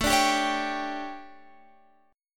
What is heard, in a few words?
D Major 7th Suspended 4th, chord